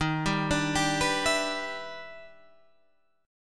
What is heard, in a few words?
E Minor 7th D sharp; arpeggiated